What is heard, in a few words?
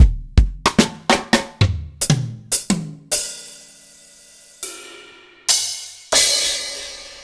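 Roland MV8800 drum STANDARD KIT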